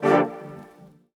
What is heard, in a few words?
D Synths, One Shots; Keys & Synths; Touch Loops; BlissedOutBeats